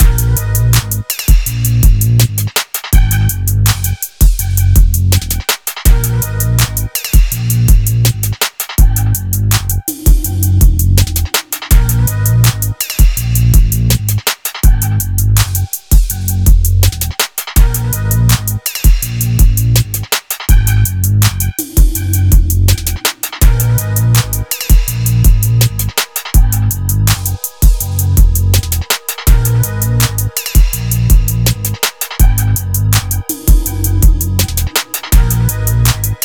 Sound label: beat